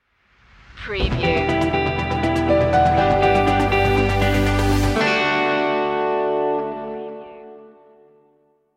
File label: optimista stinger